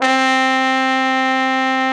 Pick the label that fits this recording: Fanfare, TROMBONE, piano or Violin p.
TROMBONE